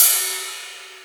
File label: Ride, Rides; Electronisounds